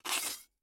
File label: Take Shovel; Game rip; MiSide